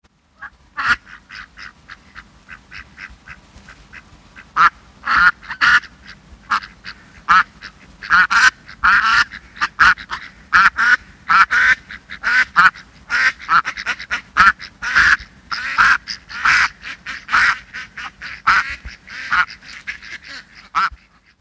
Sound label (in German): erpel und genervte ente